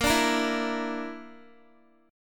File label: A sharp Major Flat 5th, Guitar; strummed; chordshape